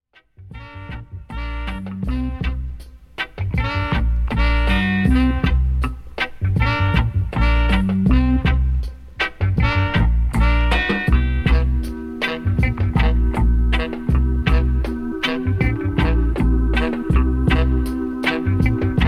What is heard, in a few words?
instrumental reggae